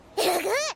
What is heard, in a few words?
uguu